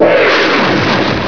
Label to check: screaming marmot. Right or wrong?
Wrong